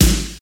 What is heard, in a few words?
juicy snare one shot g sharp key